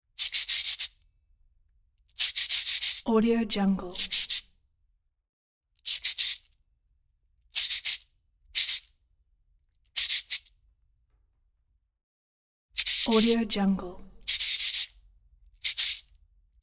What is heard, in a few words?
int back scratching; collanderson